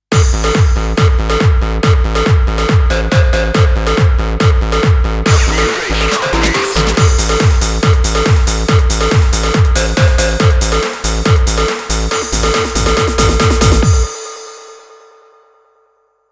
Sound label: Drop D Bass; Sony Ericsson; W710i; PlusFourSix; Charlotte